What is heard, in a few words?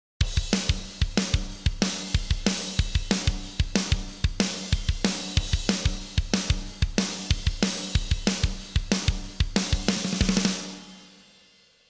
Drums On